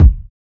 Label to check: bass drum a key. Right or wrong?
Right